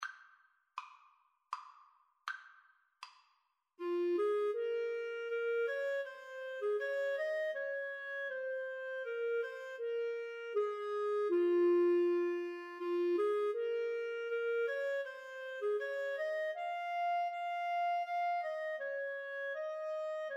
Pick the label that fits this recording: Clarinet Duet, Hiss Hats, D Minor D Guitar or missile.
Clarinet Duet